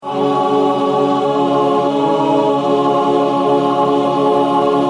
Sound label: choir; Choir Praise